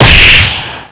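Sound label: fist heavy hit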